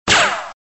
laser zap

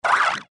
robo

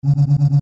wibble

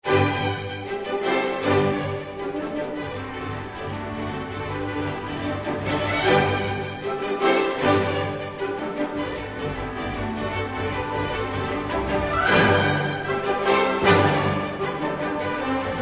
song

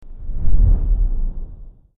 missile

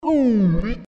sweep

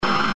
tv static

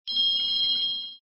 bounce

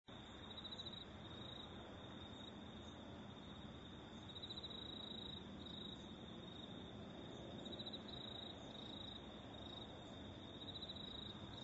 ambiance

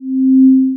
level up; ubertube

